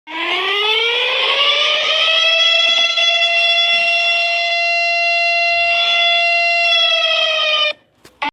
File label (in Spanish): sonido de alerta Roja